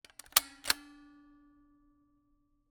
Camera, Kodak EK2 Instant, polaroid, large, plastic, springs, snapshot; Eiravaein Works; Vaeyan IV